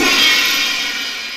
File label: 909 crsh